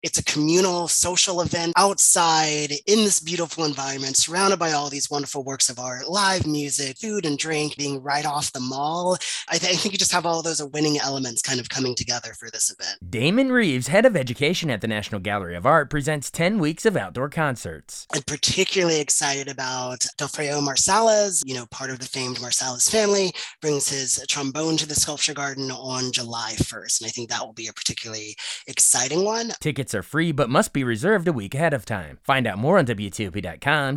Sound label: jazz in garden